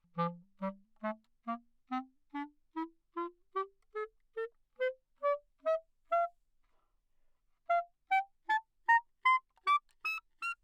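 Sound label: staccato ascending, anechoic classical clarinet c; OPENAIR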